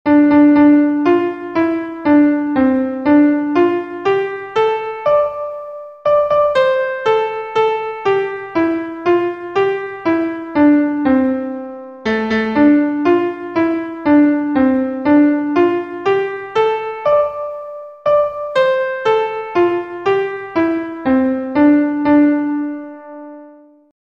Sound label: tune